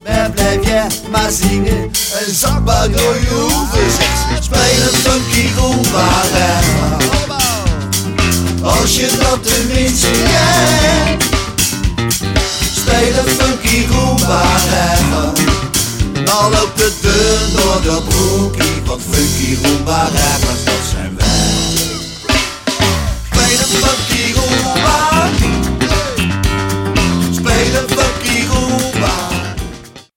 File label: Funky rumba